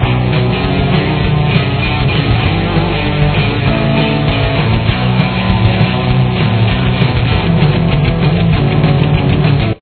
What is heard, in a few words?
solo